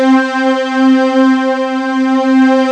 PAD Low Pad; Best Service Gigapack 1

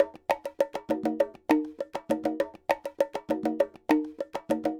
Conga Groove, Latin Percussion; Sonic Foundry (Sony Creative Software); Joe Vitale Latin Percussion; Grooves

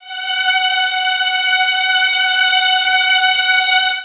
STRING LICKS, Disco

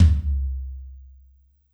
Room Tom 1, Yamaha PSR-E323, Room Kit; 300 Drum Machines

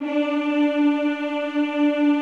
D3, Classical Choir; Best Service; Extended Classical Choir

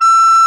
RECRD E5, PIPE

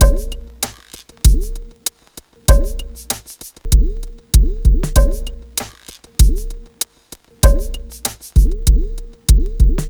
BEAT, Hip-Hop & R&B; USB Soundscan vol.47; AKAI

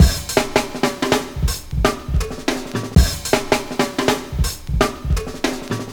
162, Loops, breaks; M8

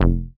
bass F1, ARP Odyssey

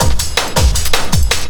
LOOP L, Drum & Bass 160 BPM; Ueberschall; Drum & Bass Flash Trackz

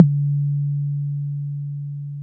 Tom Syn Lo, Korg Toms; Korg 05R-W

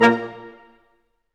BRS Quintet staccato, Symphony Orchestra; Roland LCDP08

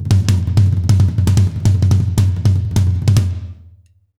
160 B BEAT, Jazz Latin Drumloops; USB Soundscan vol.08; AKAI

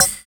DRM OLD, dance synth

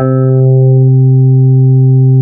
Basso C4, Fairlight CMI IIe, Basses

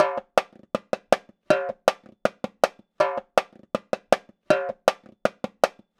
Timbali Groove, Latin Percussion; Sonic Foundry (Sony Creative Software); Joe Vitale Latin Percussion; Grooves

